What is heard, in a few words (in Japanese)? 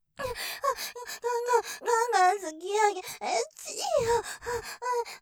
喘ぎ; ガンガン突き上げえっちぃよぉ；；; ループで楽しむ！シェフの気まぐれ抜き抜きコース